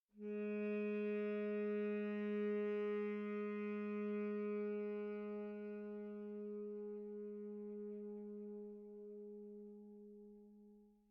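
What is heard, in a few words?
Sky High Altitude Bass A sharp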